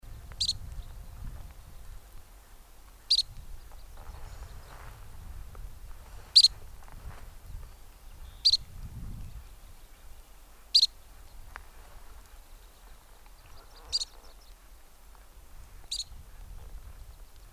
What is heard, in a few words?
arctic ground squirrel